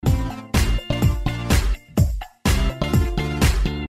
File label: beat